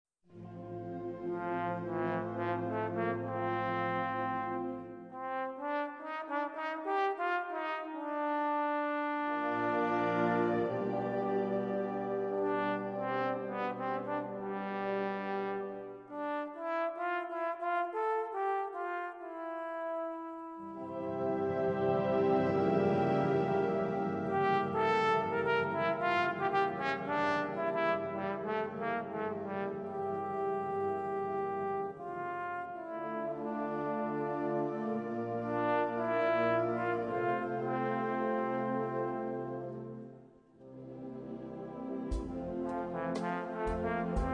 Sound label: Choral for Trombone